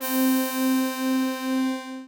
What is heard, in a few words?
string